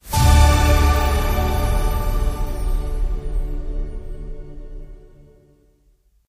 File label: outro Free Spin; fairyred